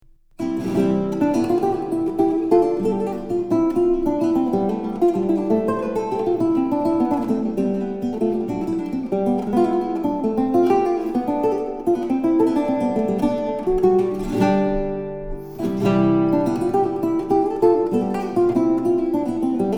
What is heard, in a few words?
Guitar Sonata In C Corrente; Roncalli; Guitar Sonata 8